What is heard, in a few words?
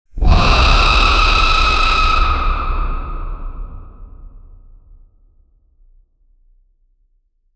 dark phoenix rage scream; mythical